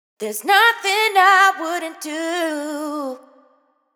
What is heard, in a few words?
hook Nothing 140 Bb wet, Vocal; Slate Digital Omnivox Vocal Sample Pack